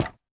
树脂砖踩踏声

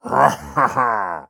庆祝声; 掠夺者

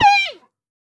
熊猫受伤声

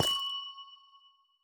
紫水晶踩踏声

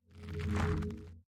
紫颂花生长声

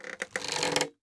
弩装填中段声

